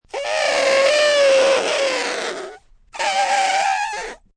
Elephant, Animal sounds, elephant, (human voice); Elephants; Sound Effects:Animals; Voice Box

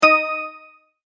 multimedia tone correct; Tones (Sound Effects:Sound Design); McKinney Sound

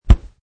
Punch, Martial arts - Punch; Martial arts - Punch - Variation 5; Punches and Hits; Combat

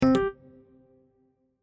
Correct Double Ding

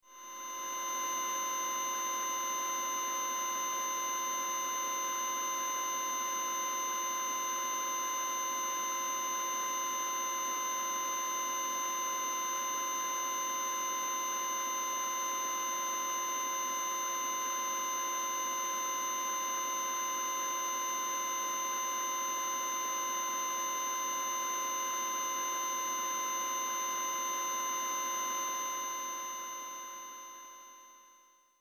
Science Fiction Computer Screen High Pitch Static

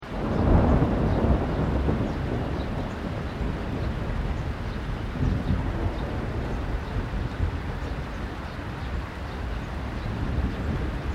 THUNDER DISTANT LOW LIGHT RAIN BIRDS; Elemental weather effects

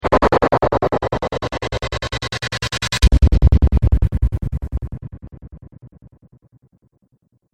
Movie Trailer Scifi, Transition

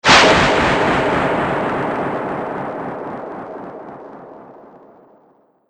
Epic Destruction Delay, impact; Miscellaneous Impact Sound Effects

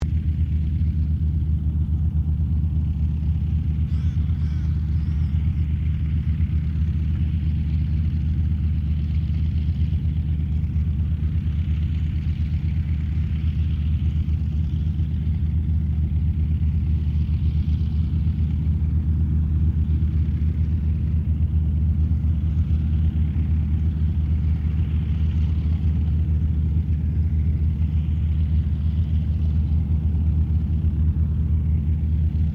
AIRCRAFT CERES WINJEEL IDLE DISTANT; Mixed aircraft squadrons; Airplanes; Vehicles

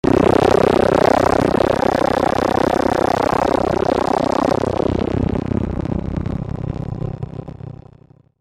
Chopper Warble Distortion Descend; Blastwave; Chopper Sound Effects

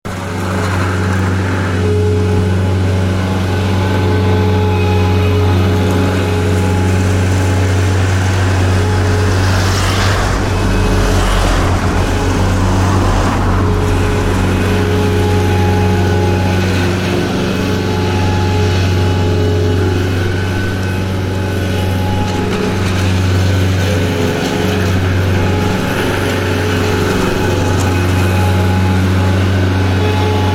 Ride On Mower, Tractor mower cutting crass.; Tractors

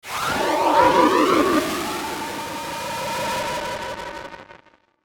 Static Pan Fuzz Flange; Production Element Imaging Element Accent Transition; Blastwave; Static Sound Effects

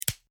Wood Break Snap Crack Foley; Small; Materials; BBFX

